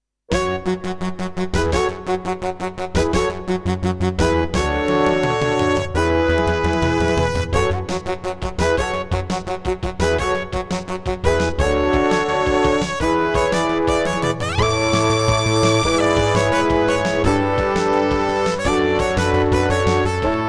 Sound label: MT-32; 4D Sports Boxing (Mindscape)